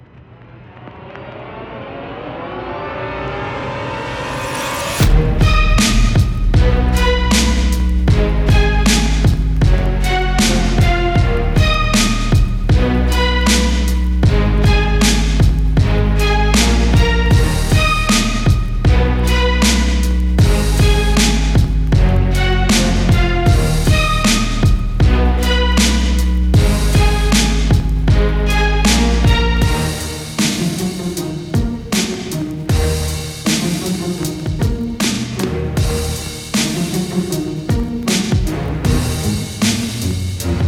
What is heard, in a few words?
Macabre; Alt Mix; Anger Music Library